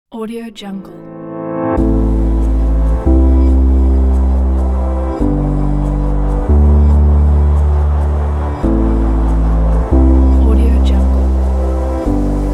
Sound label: motivational trailer